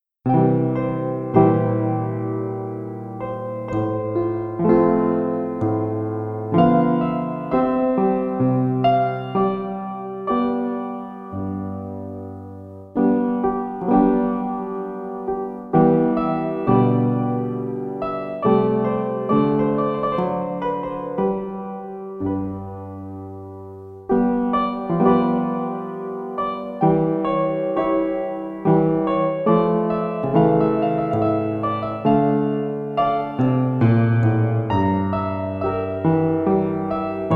Pianoforte, Sarabande; J.C.F. Fischer

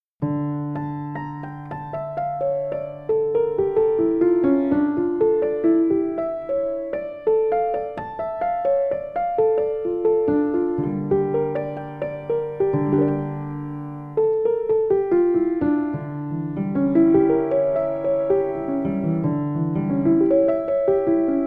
Swiss Grand; Praeludium; G.F. Haendel

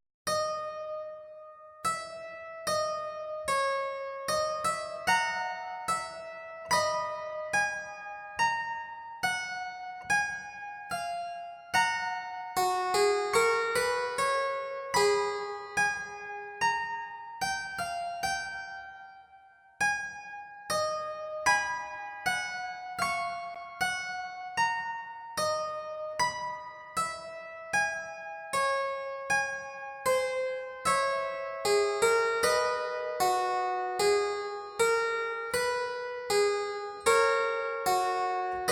Dutch Harpsichord 4; Choralis in cantu; Samuel Scheidt

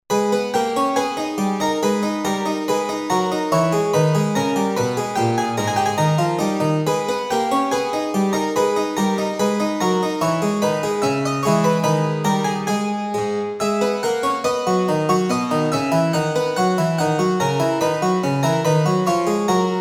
English Harpsichord Tutti; Rondeau; Fischer

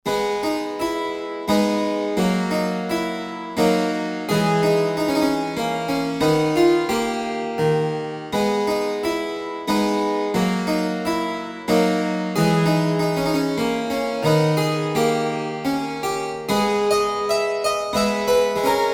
English Harpsichord 2x8; Chaconne; Fischer

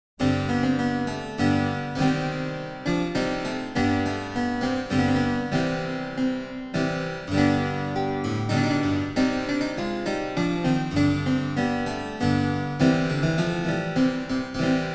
German Harpsichord Rear 8; German Harpsichord 1738; Rear 8 Demo; Excerpt from My Ladye Nevels Grownde by William Byrd; edition germanisches nationalmuseum

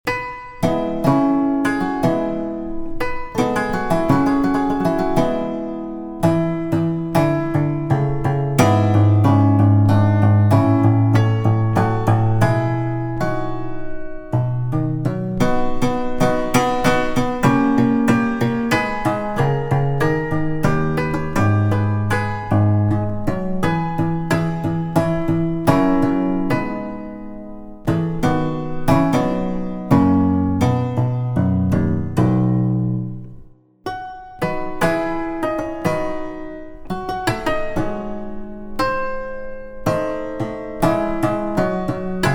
Lutheal Piano, Cimbalom; Bach; edition mim

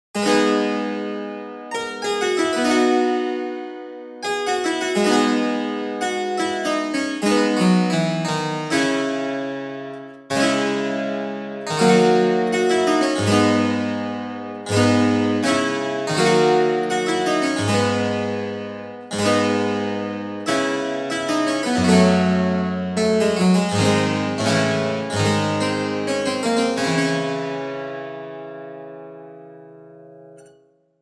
Dutch Harpsichord Tutti; Preambulum; Leonhard Kleber

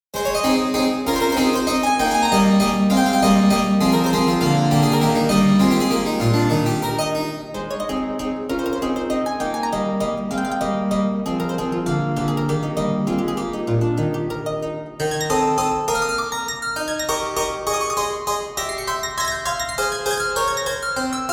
English Harpsichord, Allegro A; Thomas Augustine Arne

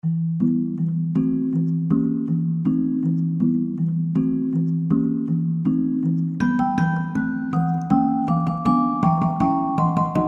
Dulcitone Celesta; Dance of the Sugar Plum Fairy; edition beurmann

